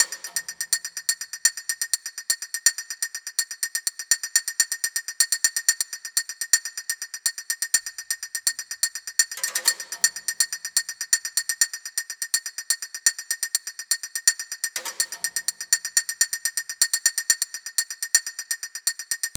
Music Rooftop Percussion; Battlefield 3

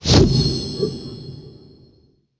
powerup sniper; Golf Blitz 1.12

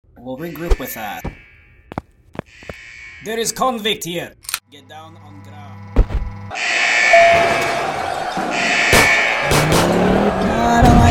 Toss Hats

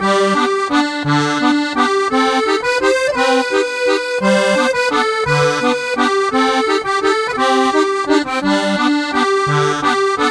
Cruise Accordeon Tune Loop

